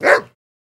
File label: Dog Bark Yelp, golden setter